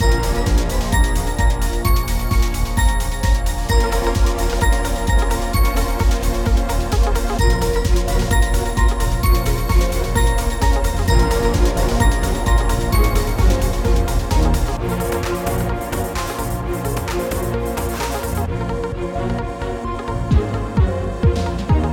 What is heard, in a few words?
battle crystal